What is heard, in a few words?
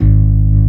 BS G#, Hip-Hop & R&B; USB Soundscan vol.47; AKAI